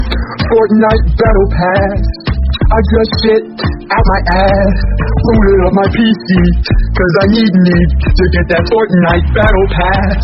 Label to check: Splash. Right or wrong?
Wrong